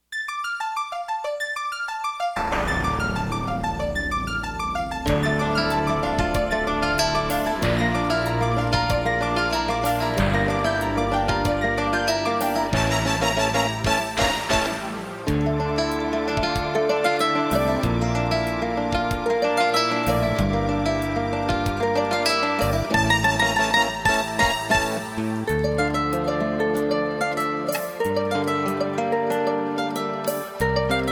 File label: Piano, spanish guitar